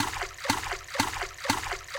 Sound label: Water